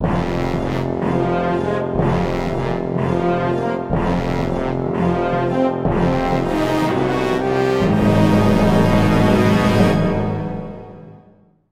Defeat Med Agitated; Sid Meier's Civilization Revolution 2; vita

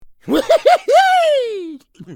Pig laugh; Bad Piggies

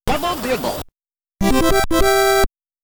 START (Press Start Button BGM NES Ver.); konami famicom chronicle vol.1 disk system edition

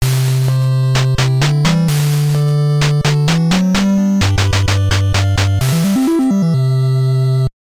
Final Boss Clear; Pizza Pop; nes

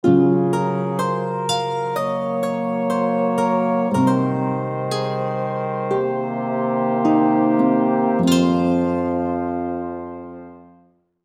Med Calm; Sid Meier's Civilization Revolution 2; vita

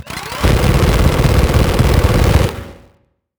Cannon Shot; helltaker

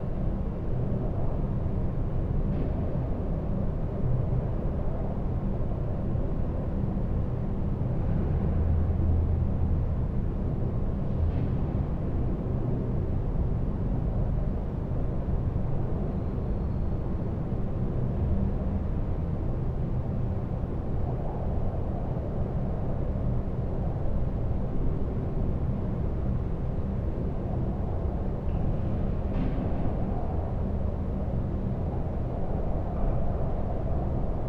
ambience - exterior

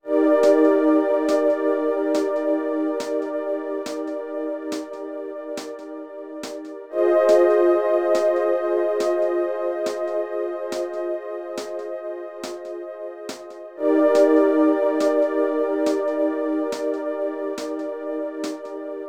Ambient; Rhythm Heaven; NDS

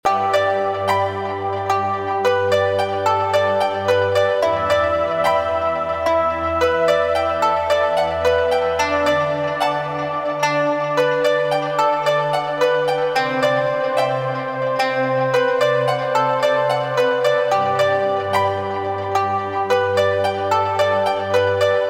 loop; beat me windows gamerip 2020

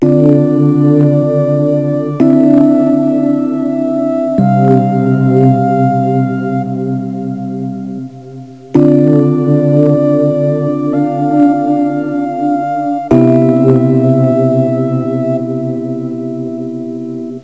Quiz Loop; Visual Logic Training; NDS

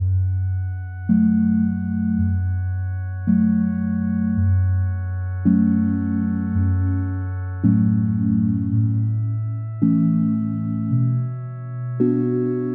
Woods Sad; Even the Ocean

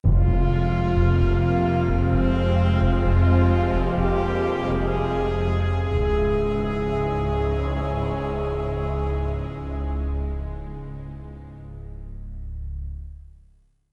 Death

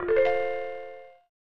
Correct!; Jeopardy; snes

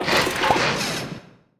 UI Build Milestone

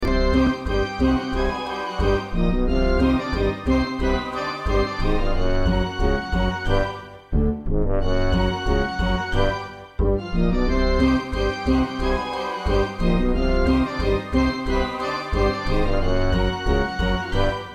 Evil Gloating Loop; rpg maker mv

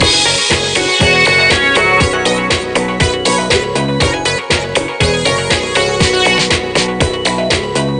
Music Dance

